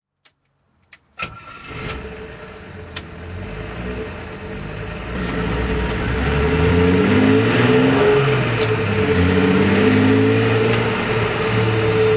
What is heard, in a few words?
maserati 3500gt drive, Maserati 3500 GT, 1961; Motorgeluid rijdend; V3D/ ClassicarImages